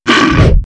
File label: attack